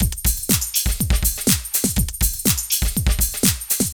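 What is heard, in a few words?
drum loops, right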